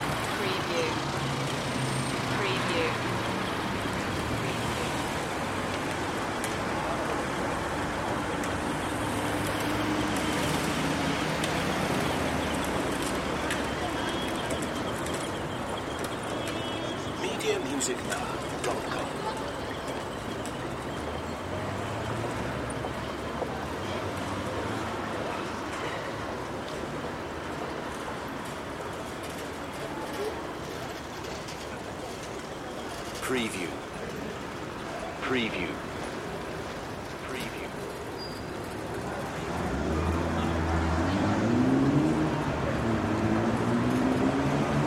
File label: city ambience germany